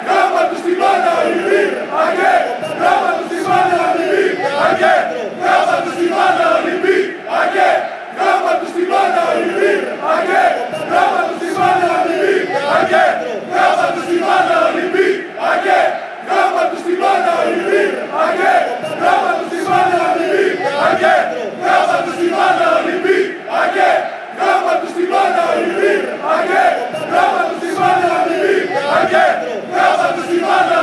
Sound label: unknown chant